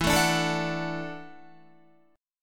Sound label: E 7th Suspended 2nd Suspended 4th E down Guitar 4E 4A 5D 5G 5C 6F; strummed